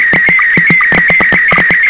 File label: sstv, scanner